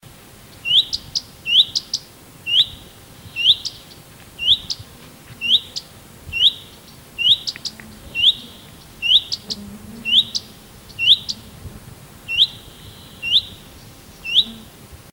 gartenrotschwanz

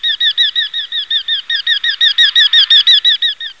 baumfalke

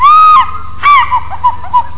schreiseeadler

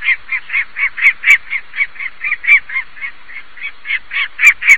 lanner

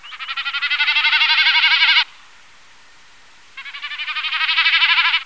bekassine

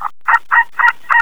seeadler

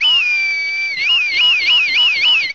rotmilan

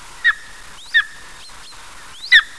steinadler

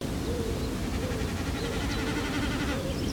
bekassine

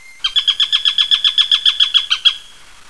sperber

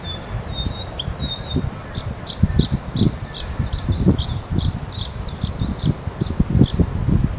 blaukehlchen

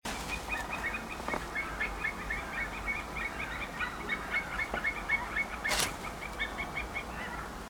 seeadler

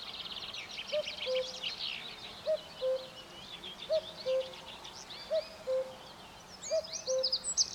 kuckuck

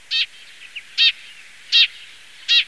spatz

